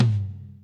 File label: Tom Hit; osu